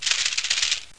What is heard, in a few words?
bushes